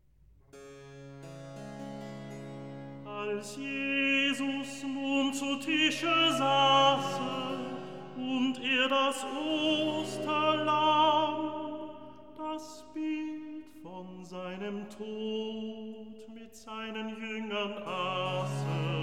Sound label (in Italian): Recitativo; Als Jesus nun zu Tische saße; Handel; Brockes Passion